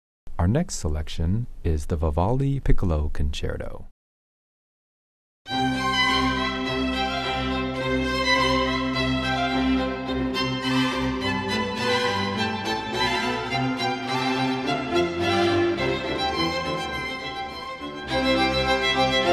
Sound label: piccolo; vivaldi; Classical